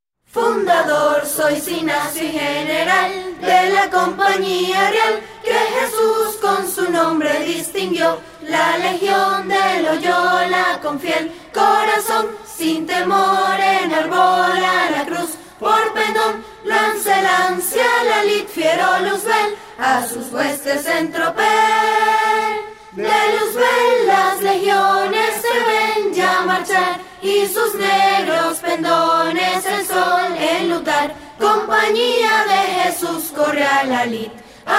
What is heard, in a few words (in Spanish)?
himno